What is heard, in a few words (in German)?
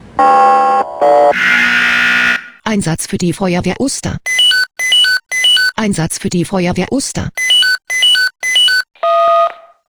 SMS Alarmierungstone; Uster